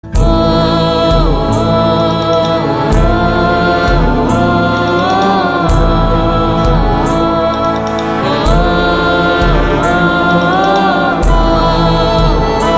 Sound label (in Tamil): Humming; Tamil; Jagame Thandhiram